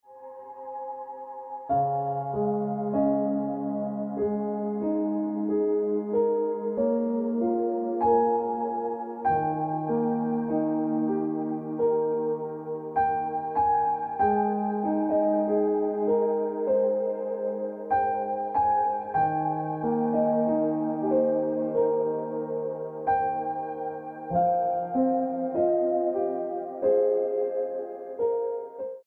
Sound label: Keep It Colorful Ringtone Piano Music